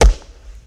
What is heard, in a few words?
Mega Body Punch, Hand To Hand Combat; Sony Pictures Sound Effects Series